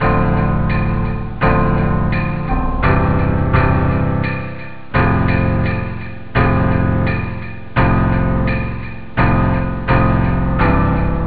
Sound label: dance; OctaMED SoundStudio V1